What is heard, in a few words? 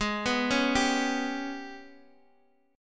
Gsharp Minor Major 7th Double Flat 5th, Guitar, Open D; x,x,6,5,4,5